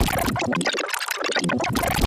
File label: Garbled Transition